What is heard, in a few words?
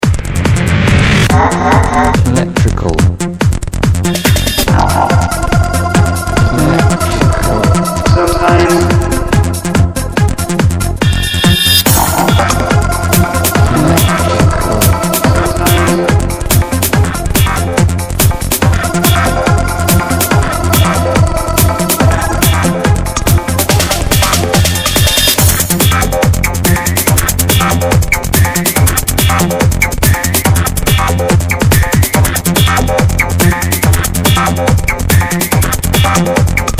Electro Journey